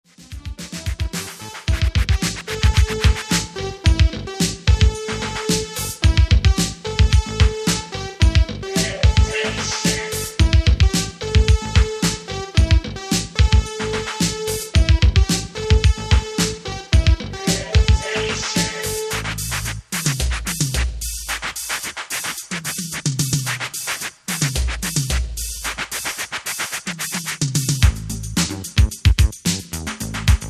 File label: Sine Rotation